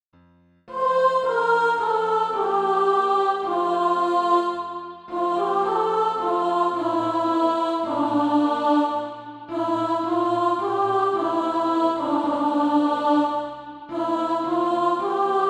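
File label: soprano, SATB Practise Music; Down from His Glory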